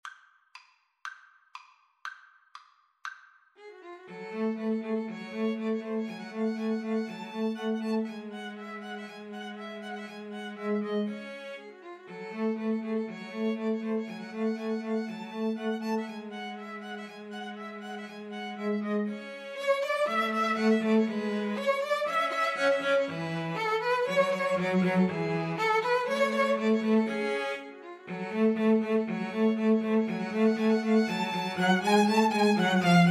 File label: Piano Trio